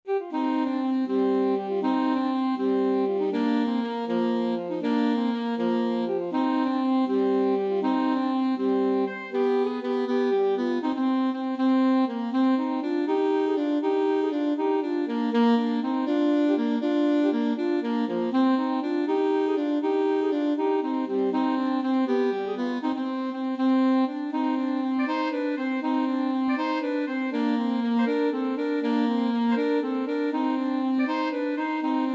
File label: brian boru alto saxophone duet